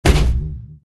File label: Hit